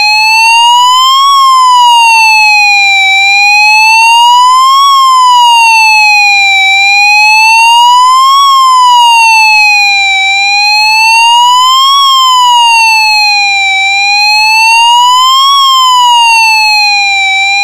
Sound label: special warning